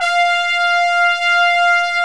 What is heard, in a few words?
SYMPHONIC BRS